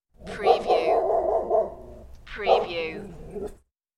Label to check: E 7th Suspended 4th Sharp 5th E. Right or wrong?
Wrong